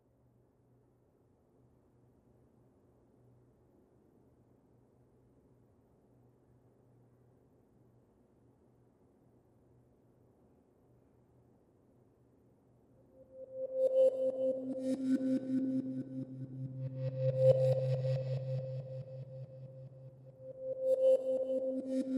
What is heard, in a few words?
Synths Only